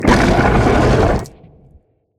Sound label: SPINELING GIANT damage